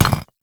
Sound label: Footstep; Warframe; Qorvex